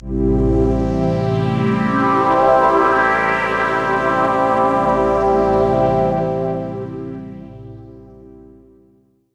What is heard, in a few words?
phaser; buzz venus